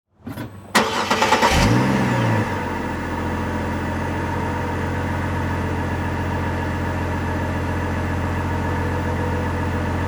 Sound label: Transportation Truck Envoy Start Idle Off Exterior Mic In Rear On Ground